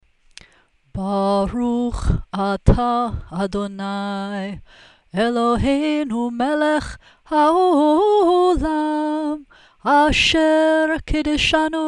Shabbat Candle Blessing sung; Bnai Mitzvah Requirements; Service Practice

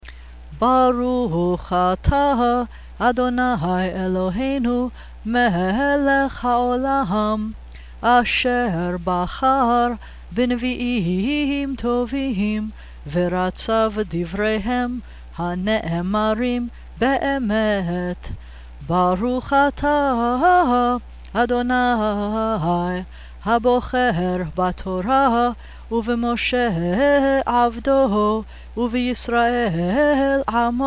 Haftarah Blessing before sung; Bnai Mitzvah Requirements; Service Practice

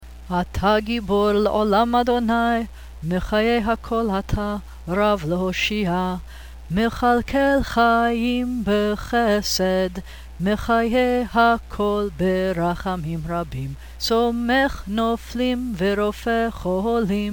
Gvurot sung; Bnai Mitzvah Requirements; Service Practice

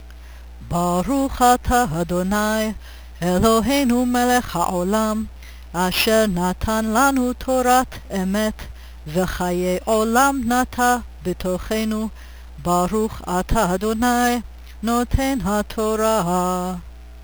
Torah Blessing after sung

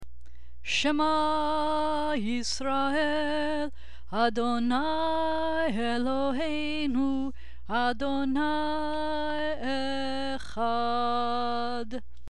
Shema sung; Bnai Mitzvah Requirements; Service Practice

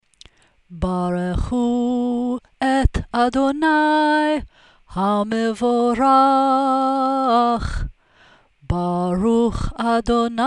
Barchu sung; Bnai Mitzvah Requirements; Service Practice

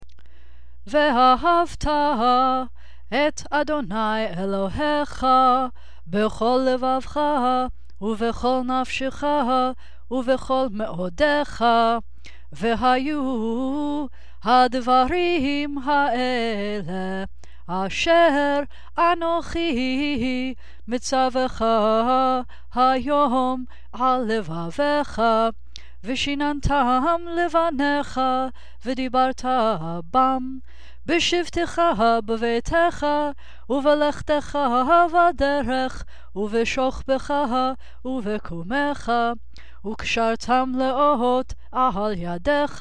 Vahavta sung; Bnai Mitzvah Requirements; Service Practice

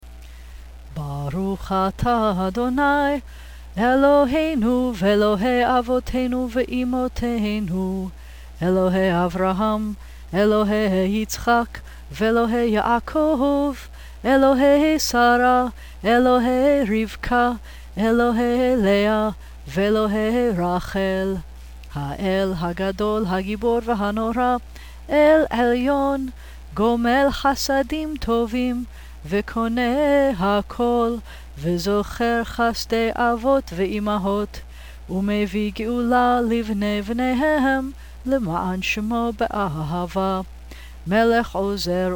Avot sung; Bnai Mitzvah Requirements; Service Practice

